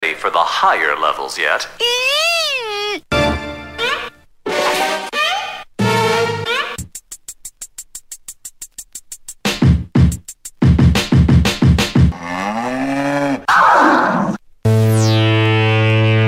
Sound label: Scratch tools